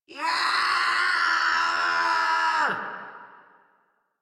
Scream; Warframe; Grineer Catbrow Handler

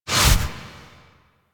Melee Damage Multiplier, Whooshes; Warframe; Weapons

